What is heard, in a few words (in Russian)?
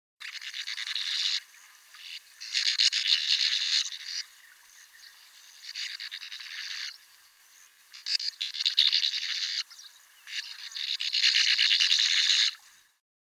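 beregovaya lastochka golos, sand martin voice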